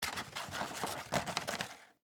Coffee Maker Filter, Household, Coffee, Maker, Insert, Filter; Blast Wave